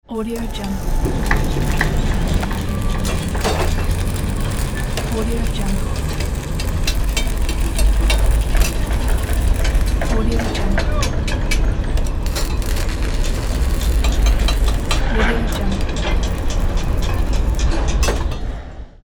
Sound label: building construction ambience; ed-soundmaker